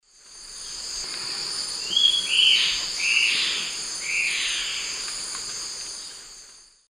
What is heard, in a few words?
Phlegopsis erythroptera, song; S. America; Eastern Lowlands